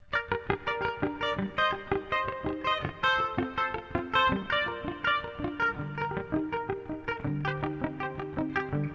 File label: triads mix